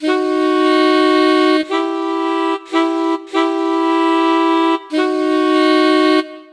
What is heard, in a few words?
synth; widdavirtual